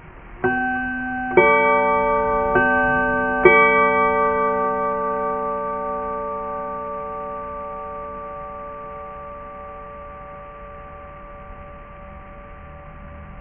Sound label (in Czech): hodiny